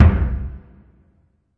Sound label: big burundi, African Burundi Drums